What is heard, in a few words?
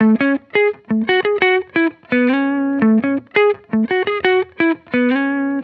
Electric Melody Loop 170 BPM A Maj; Cymatics